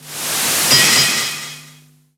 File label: Magic Ice; Etrian Odyssey III HD